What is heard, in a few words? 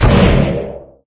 Switch; MapleShrine; mkxp; gameasync